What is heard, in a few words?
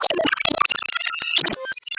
rewind; prosoundfx